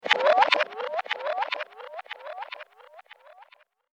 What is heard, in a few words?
shuffle sms